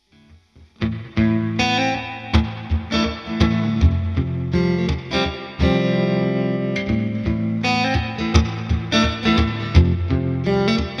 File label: clean, strat vintage; Neck 2; Sounddemos Stratocaster Pickups